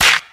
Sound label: hand clap c key, Royality free clap single shot tuned to the C note. Loudest frequency: 3339Hz; percussion; single hits one shots